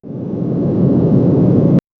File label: a short quiet sound of, air burst